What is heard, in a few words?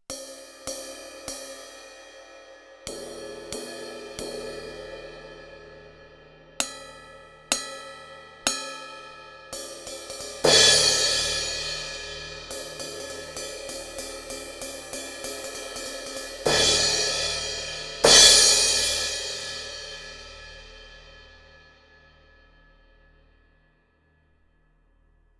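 crash ZILDJIAN A CUSTOM 16, CYMBALES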